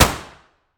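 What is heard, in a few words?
weapon dmr sup fire player atmo int; gmod; viper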